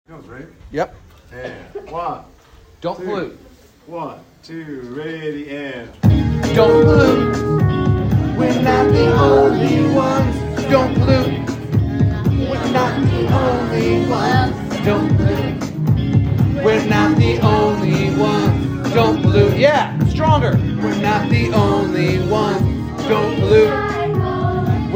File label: Beers chorus practice